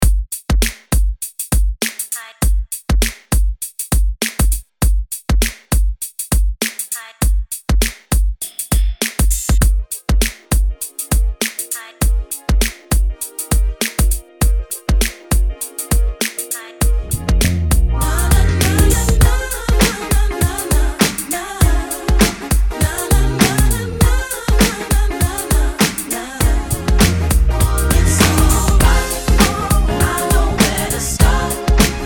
90s ReDrum 100 Bpm

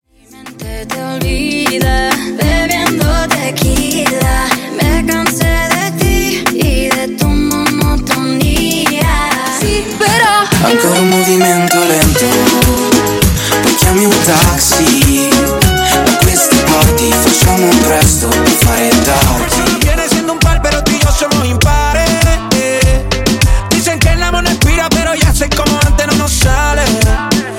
Reggaeton Moombah 100Bpm

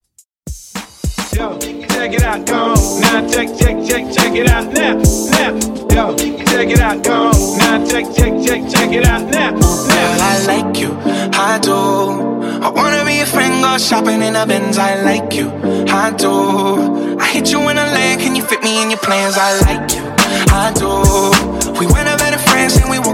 Top40 Pop Dance Redrum Dirty 105

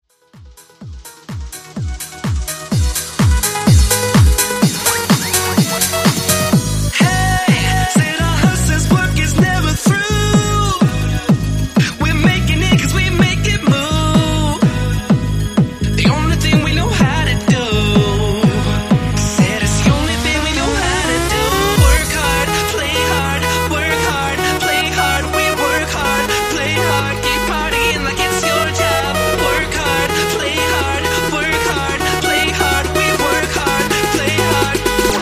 Tech House; Mashup

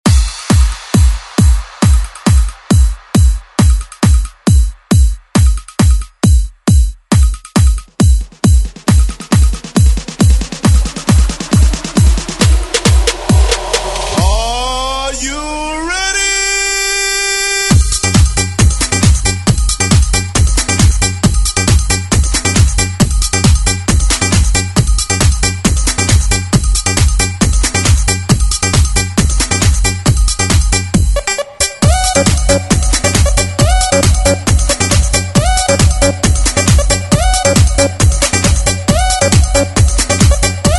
90s ReMix ReDrum

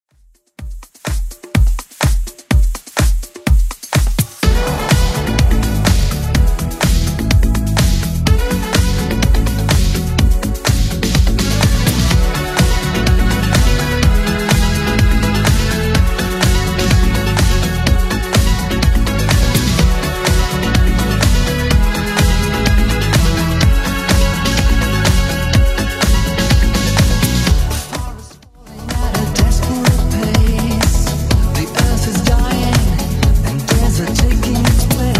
80s Club Edit 125bpm

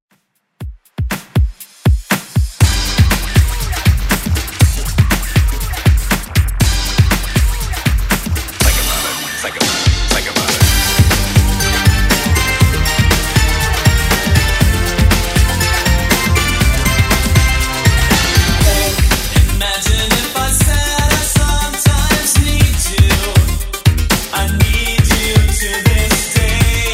80s Redrum 120bpm

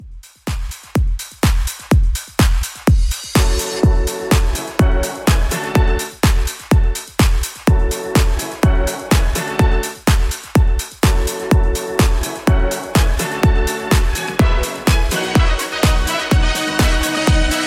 Extended Mix 125Bpm

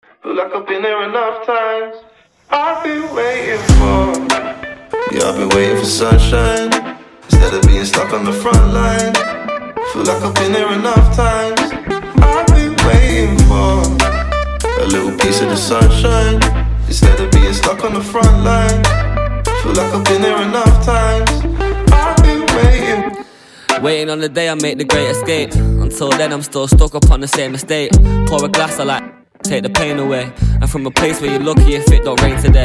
Acapella Intro Outro 99 Bpm

